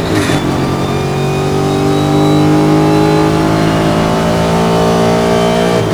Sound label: third, vehicles; bently pm